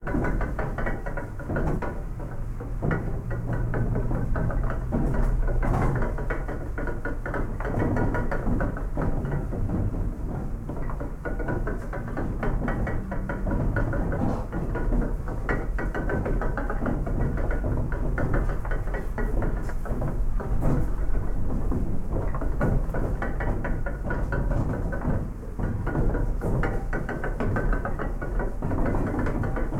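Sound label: Gears, wood, large, mill, turning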